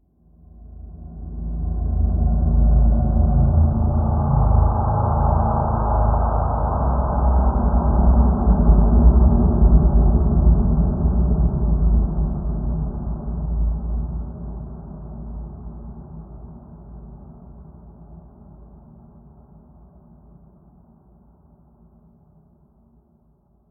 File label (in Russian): horor zhutkij les